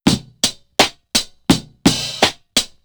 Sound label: Break 84 bpm, Drums; Loops; Phenom; Ableton Live 12 Lite; Core Library